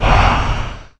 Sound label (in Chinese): 消散